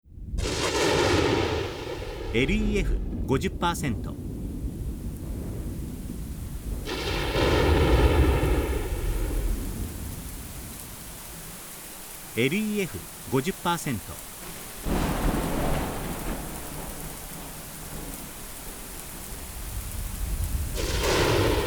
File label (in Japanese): kaminari